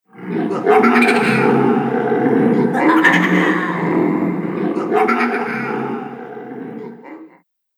Creepy Chamber Of Laughter; Scary Soundscape Sound Effects; Horror

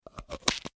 Medicine Bottle Close Lid Large; Emergency; Pops; Objects

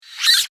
Warped High Pitched Tape Spool; Miscellaneous Cartoon Sound Effects

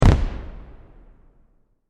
Explosion Firework Boom Single; Fireworks; SFX Bible

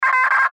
Radio Morse Code; Weak Signal Letter L; Warfare; Blastwave

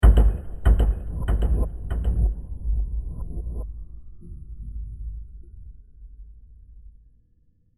Delays With Sweeping Reverb; Miscellaneous Impact; Impacts; Crafted Signals

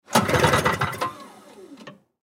Snow Blower Stall, Snow Blower Pull Start Stall; Snow Blower Pull Start Stall String Release Slow; Household

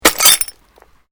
GLASS SHARD SMASH

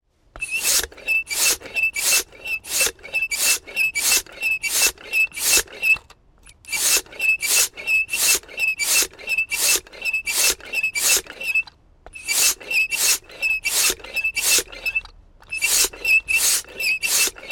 Foot pump, Pumping up tyre - squeaky pump; Air Pumps; Machines; Tom Hutchings

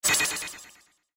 Sci Fi Sonar Whoosh

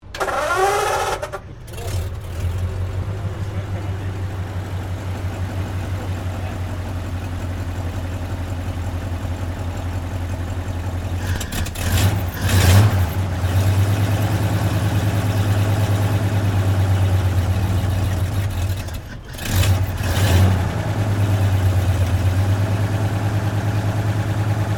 Truck Ford 1300 weight 1941 startup idle; Classic commercial vehicles; Trucks

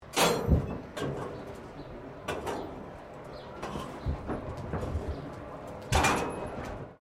Blacksmith Tongs Into Forge; Colonial era objects; Machinery

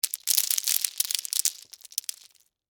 Debris Lima Beans; Texture; Materials

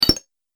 Robotic Menu Click

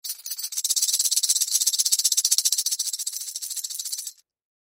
Plastic Tambourine, Plastic Tambourine Shake Fast; Rattle; Musical; Blastwave

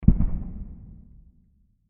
Diffuse Explosion; Blasts; Impacts; Crafted Signals

